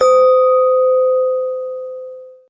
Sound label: Notifier Chi Gong, BlackBerry; BlackBerry 9000-Bold